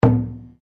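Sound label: Drum Taiko mezzo forte, Japanese Taiko Drum; Miscellaneous Musical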